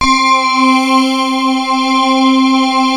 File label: chromium, pads; Rubber Chicken Software Sample Bank; REAL INSTRMT